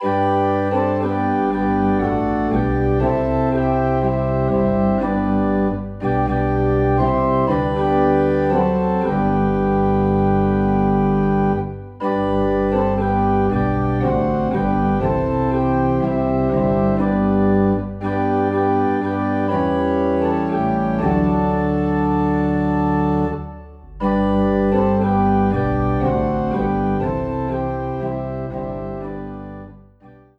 Organ in G; Softly and Tenderly

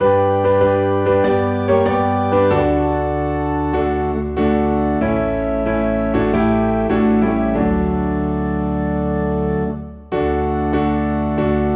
Piano and Organ in D

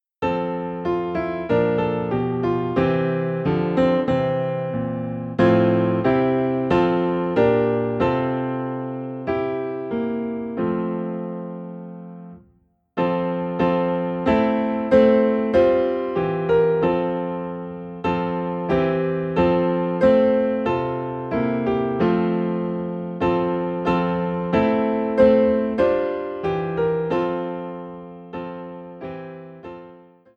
Piano in F; Christmas Piano Hymns

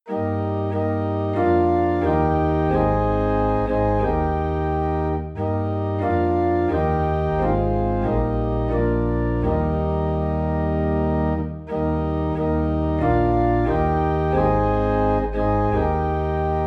Organ in E Flat; We Believe In One True God

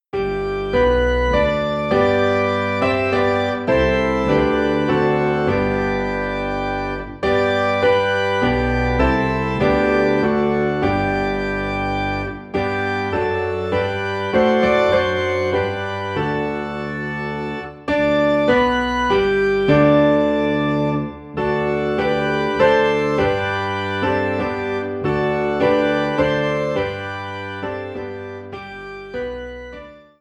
Piano and Organ in G; Come Thou Almighty King

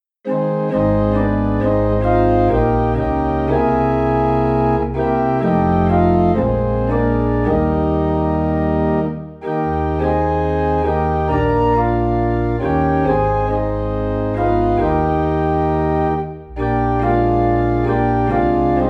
Organ in E Flat; Living for Jesus

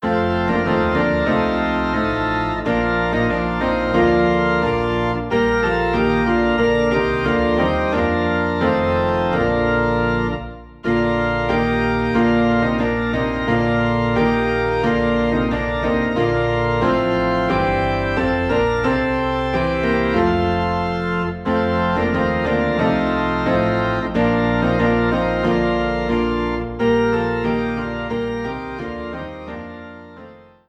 Piano and Organ Low in B Flat; O Thou Joyful O Thou Wonderful; christmas

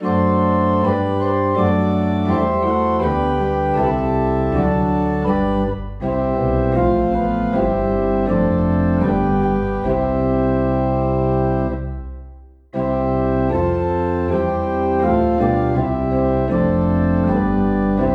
Organ in C; Oh Sacred Head Now Wounded

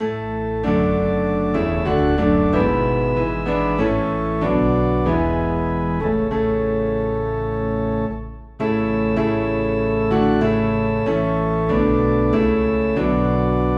Piano and Organ Low in A; We Gather Together

